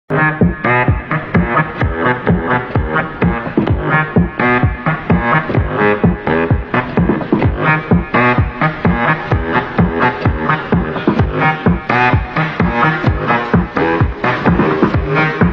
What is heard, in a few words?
Saxophone